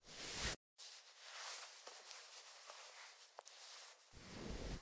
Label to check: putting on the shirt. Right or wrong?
Right